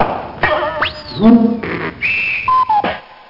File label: jingle